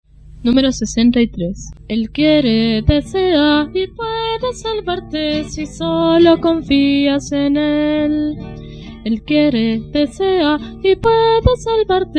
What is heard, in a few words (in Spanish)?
Coro, himnario niños